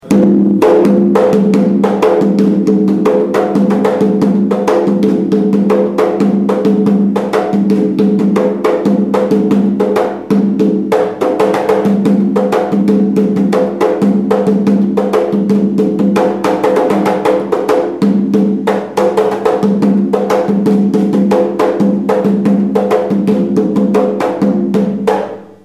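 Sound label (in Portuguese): angola, atabaque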